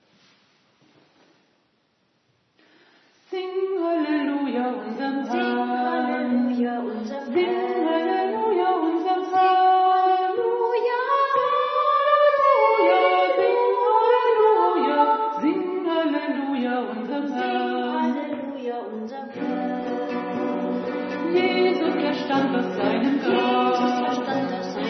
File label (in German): Sing Halleluja deutsch